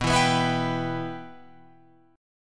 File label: B Suspended 4th Sharp 5th B Guitar Open F; Bsus4#5 strummed; JGuitar